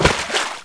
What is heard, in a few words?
wa step, footstep water